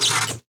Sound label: Electricity - Jolt; Ed Edd n Eddy; Adromulus Pack